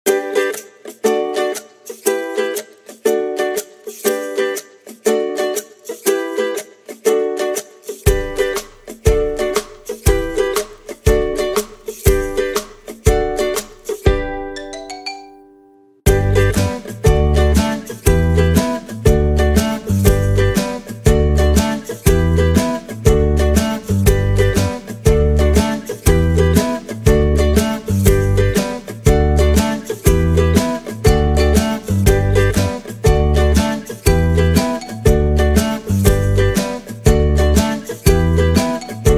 Background Music Ukulele Happy Kids Summer Positive; Joyful